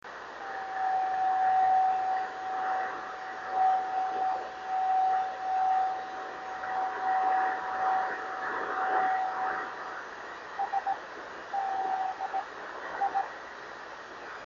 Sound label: 26740KHz, driftnet beacons